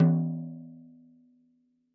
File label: tom hi, gretsch kit